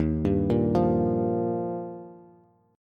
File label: Eb Major Add 9th, Bass; Ebadd9 arpeggiated; 11,10,8,10; JGuitar